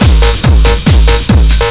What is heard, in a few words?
RYTHMES